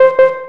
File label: alert; rust